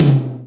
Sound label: ROCK TOM L, MPC-60 DRUMS; Greytsounds VOL-1